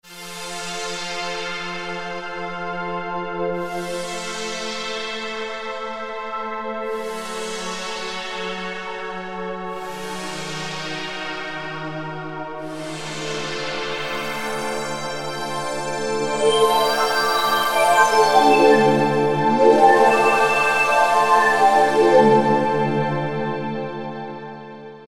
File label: Pad, Alesis Micron; Factory Programs; Morten'J